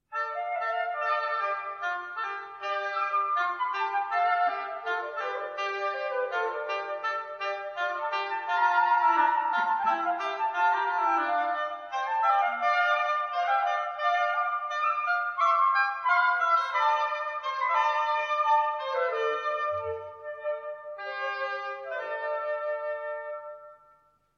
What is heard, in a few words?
Oboe